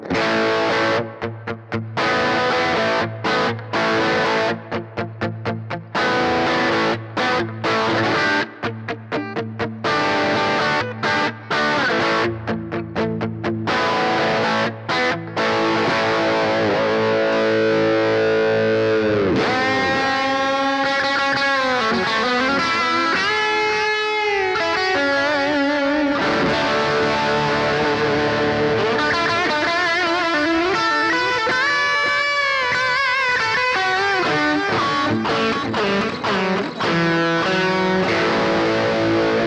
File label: Vox MV50 Direct Pedalboard Phat Drive